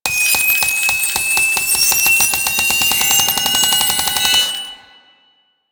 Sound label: Grenade Countdown; Cephalon Tear; Warframe; Cephalon Weave